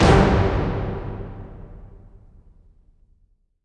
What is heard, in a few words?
Battle End